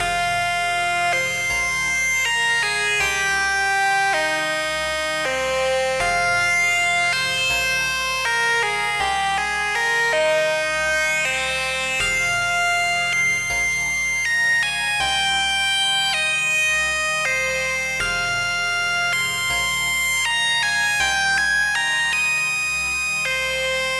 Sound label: Type Beat Melody